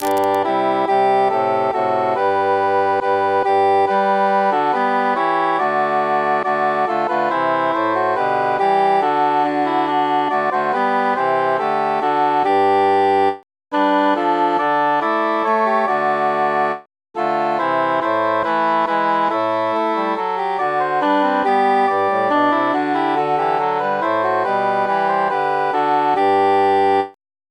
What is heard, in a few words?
quintus mute; now is the month of maying